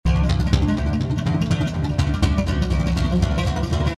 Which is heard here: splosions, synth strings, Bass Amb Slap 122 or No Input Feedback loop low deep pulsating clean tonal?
Bass Amb Slap 122